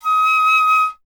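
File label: flute piccolo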